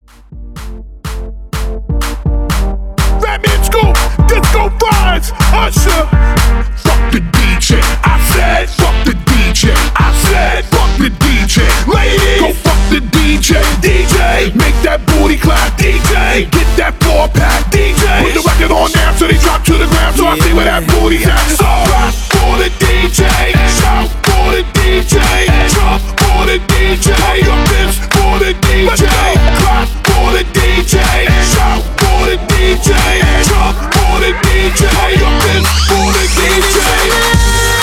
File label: Hype Urban 124 BPM; Discofries